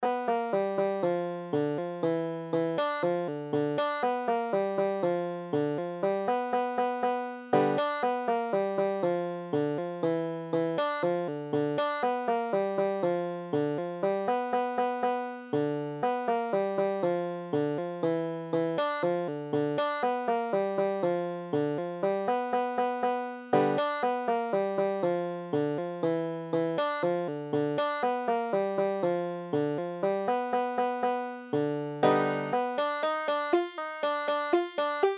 julia delaney, banjo

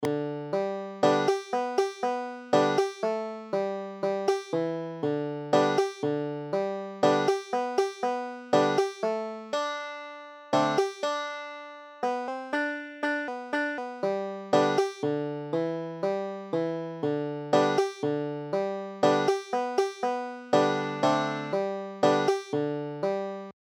banjo

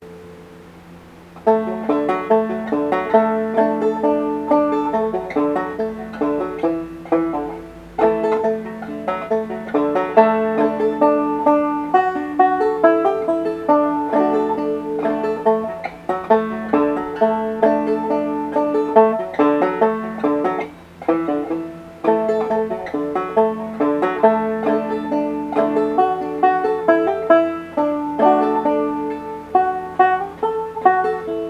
soldiers joy, banjo

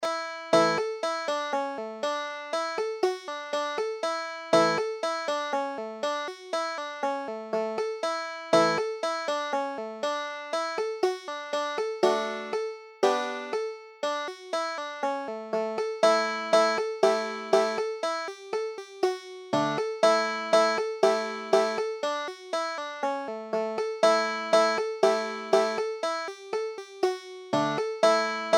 rye straw, banjo

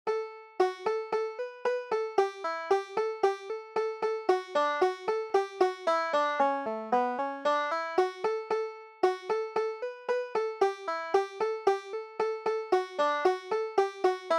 old molly hare, banjo

